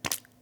Panel Burger Patty Set; Among Us

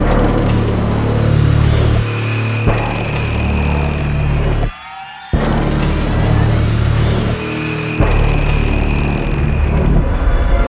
Combat Last Loop

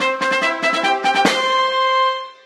Fanfare; Boulder Dash 30th Anniversary

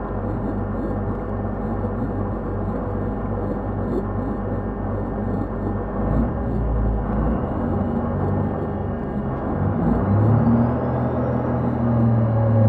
sound Shark Movement; freedom fall

